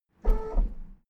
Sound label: car lift up short heavy